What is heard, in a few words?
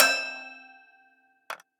Prepared Toy Piano; Instruments; Ableton; Factory Packs; Lost and Found; Lost Keys